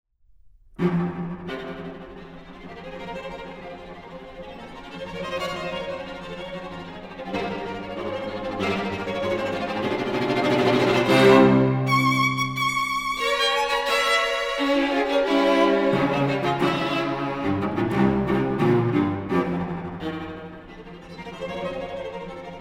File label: Quartet